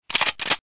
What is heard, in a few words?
shotgun reload